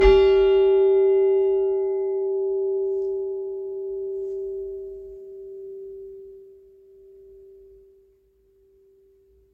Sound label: itchingfield one, bells